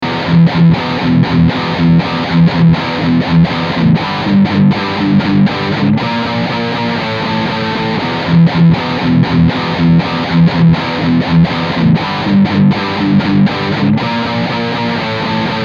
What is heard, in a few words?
NECK drive; eclipse active; line6